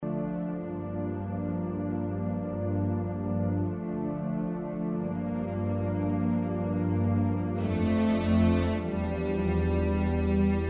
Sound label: Strings